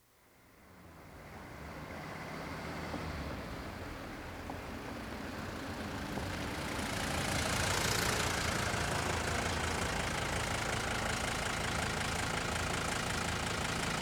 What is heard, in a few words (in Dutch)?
Mercedes 300 D, aankomen, stationair en wegrijden; Beeld en Geluid